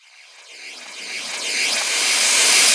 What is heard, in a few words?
reverse, FX; Vengeance Samples